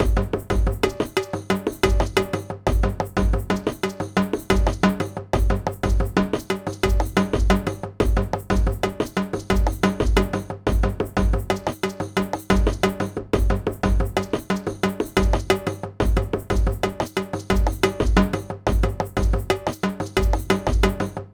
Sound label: World Kit Different More 90, Percussion Combos; M-Audio; Pro Sessions VOL-38; Abstract World Fusion 2